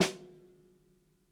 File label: ROOMY SNARE SOFT; ONE SHOTS; breaks; Basement drums vol 2